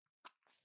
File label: matchet